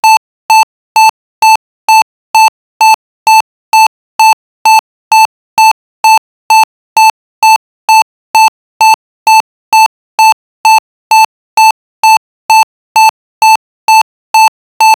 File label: beep boop bamp